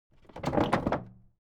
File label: object case wood old wobble; smartsoundfx